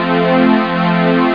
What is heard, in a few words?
string